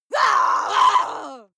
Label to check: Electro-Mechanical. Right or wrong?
Wrong